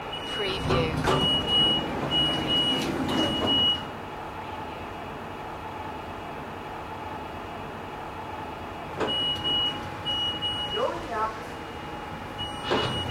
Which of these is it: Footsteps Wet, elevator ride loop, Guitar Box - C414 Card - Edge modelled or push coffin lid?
elevator ride loop